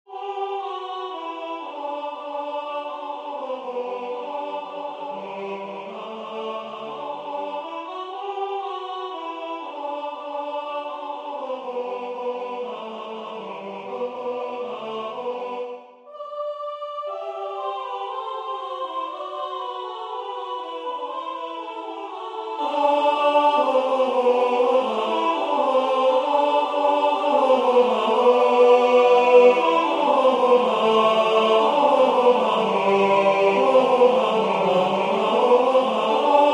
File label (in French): tenor; Les anges dans nos campagnes; Gevaert